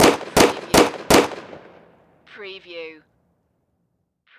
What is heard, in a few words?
Pistol 45 Springfield